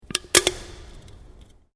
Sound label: Can Aluminium Inside Bend; various metal cans; Metal (Sound Effects:Materials)